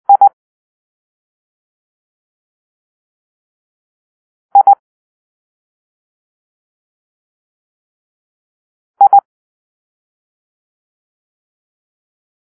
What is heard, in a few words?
India (I), morse code